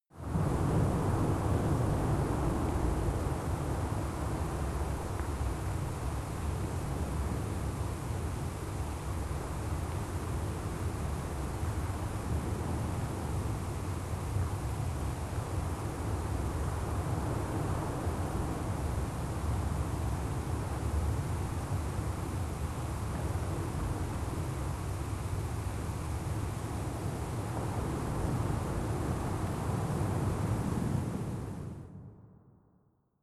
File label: water fx windy